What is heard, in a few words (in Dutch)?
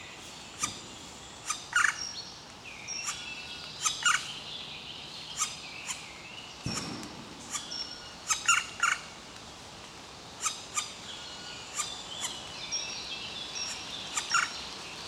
Zwarte Specht Dryocopus martius